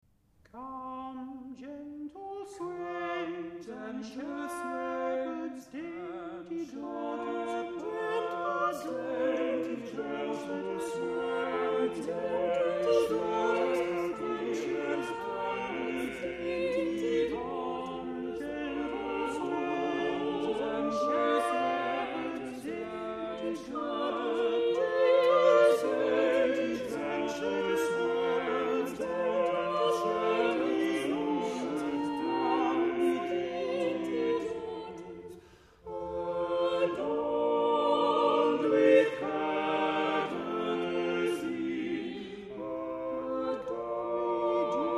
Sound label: Come gentle swains; Cavendish